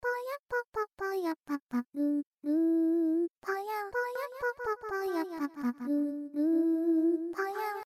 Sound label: Delay Mono